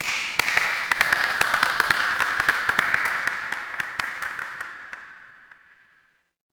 crowd applause

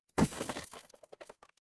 impact crash

